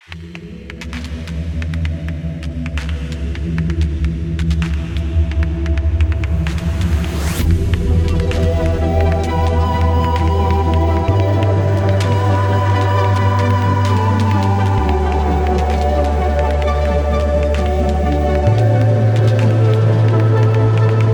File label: Bgm dungeon blood ring